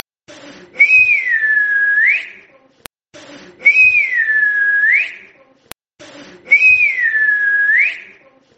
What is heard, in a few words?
whistle